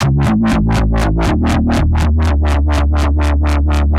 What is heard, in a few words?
Wobbler Bass, synth; Wobbler Bass 2; The Forge by Hecq; Ableton; Factory Packs